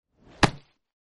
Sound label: baseball catch